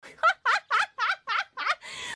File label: laugh; usada pekora